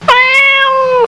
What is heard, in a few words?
CAT, 猫; 特酷声音素材库